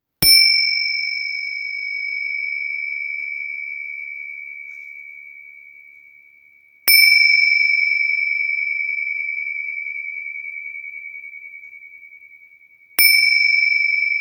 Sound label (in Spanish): Tingsha omph do re 340